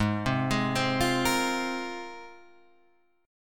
G sharp 6th Add 9th G sharp

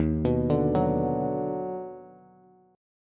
D sharp Minor Major 7th Sharp 5th, Bass; arpeggiated

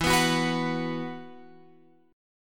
E Suspended 2nd E, Guitar, Open F; x,11,11,13,14,13; strummed; JGuitar

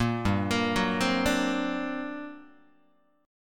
G Minor Major 11th G, Guitar; arpeggiated; 4A,4E,5A,5D,5G#,6C#; 1,3,1,4,4,1; JGuitar

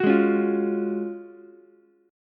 F sharp Major 7th Suspended 2nd, Ukulele; strummed; JGuitar

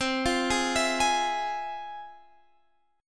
F Minor Major 7th C, guitar chord

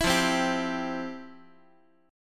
F Major 7th Suspended 2nd F, Guitar; strummed; JGuitar

